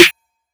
SNARE; Treble Lordz Presents Controlled Substance Kit

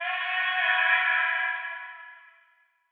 VOX

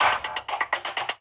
LOOP; East Coast Legendz Kit

